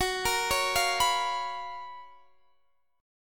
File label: Gb Major 11th Guitar Half Step Down; GbM11